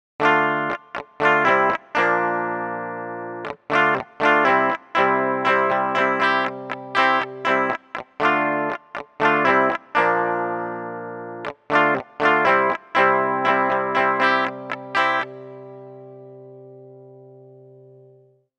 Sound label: Guitarist